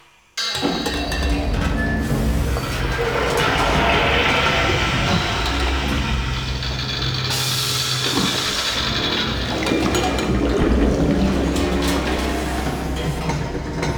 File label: Failure Pipe Tunnel Loop; Warframe; Ambience; Entrati